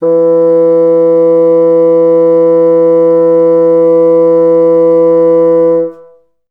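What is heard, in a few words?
bassoons sustain E2; Best Service; Peter Siedlaczek's Advanced Orchestra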